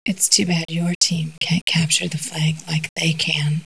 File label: taunt; tfc